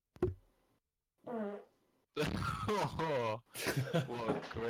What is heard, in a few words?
fart v8